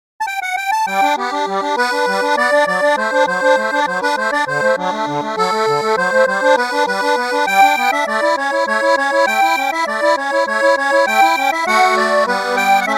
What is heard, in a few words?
String Quintet in E Major Anglo-Concertina; Akkordica; Syntheway